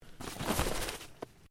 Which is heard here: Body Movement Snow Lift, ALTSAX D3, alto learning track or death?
Body Movement Snow Lift